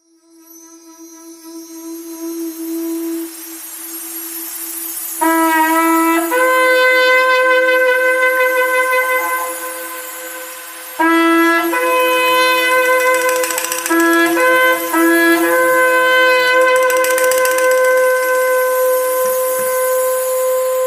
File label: Shofar; An Invitation